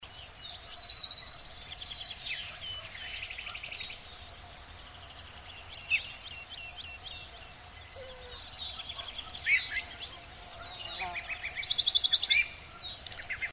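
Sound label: Western Bonelli's Warbler (Phylloscopus bonelli), Portugal; Linnut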